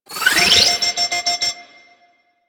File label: Shield Lancer Pistol Alt Charge; Warframe; Lasrian; Lasrian Soldiers; Weapons